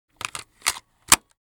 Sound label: Cassette deck player portable insert tape